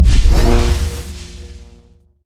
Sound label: steam golem static field; LoL; blitzcrank